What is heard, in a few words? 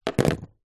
leisure toy fidget spinner metal down on hollow wood table; zapsplat